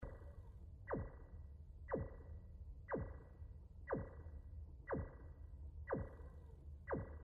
Battery Pack Beep

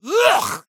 Swarmed By Flies

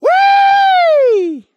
Grinding Pipes

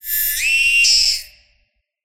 Mactera Grabber Scream

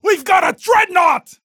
Reaction Mini Boss Roar

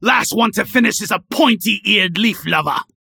Bar Cheering when drinking

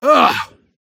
Walking on Hot Rocks

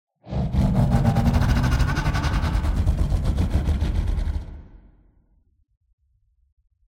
Glyphid Oppressor Idle

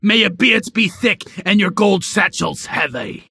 Bar Cheering when drinking

